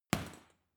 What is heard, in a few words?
boxing punching bag hit medium; boxen